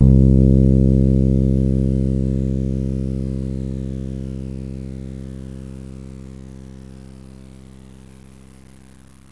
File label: Fretless Bs; GMPlay; Bass